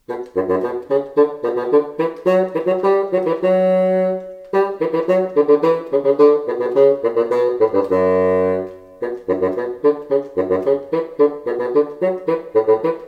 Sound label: bassoon etude